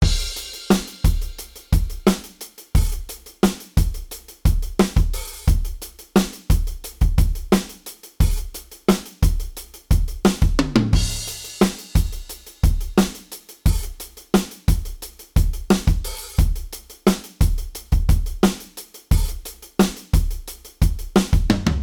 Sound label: modal surf, drums